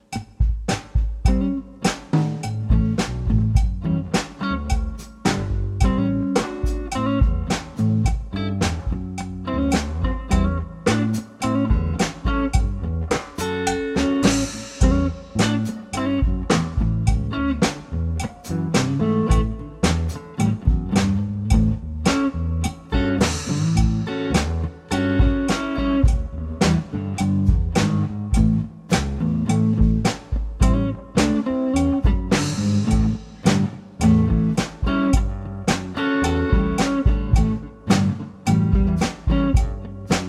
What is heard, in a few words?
cowbell groove